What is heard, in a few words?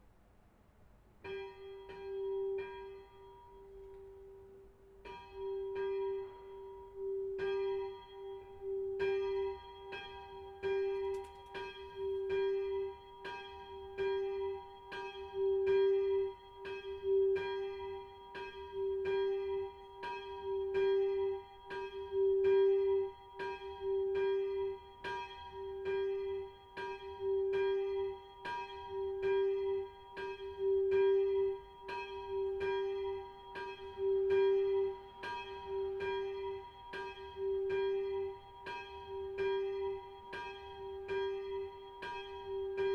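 bell Chorglöckl; northheiden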